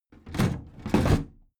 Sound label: big plastic case lid put down regular